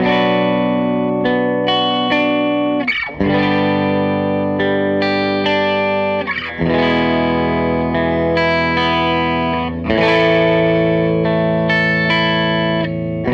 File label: SG Guitar Loop